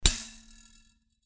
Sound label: Sword Sound; FXHome; Fighting Sounds